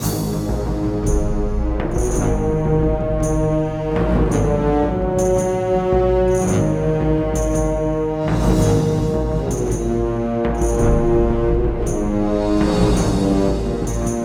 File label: Amb Outdoor